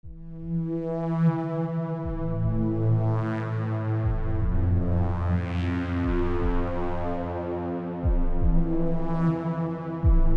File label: breath of the horror